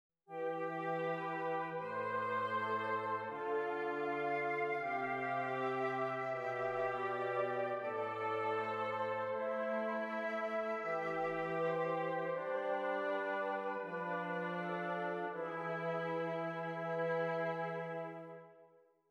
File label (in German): Stimmiger Contrapunkt; Ronge